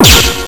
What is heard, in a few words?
Jack In; MMBNMMOP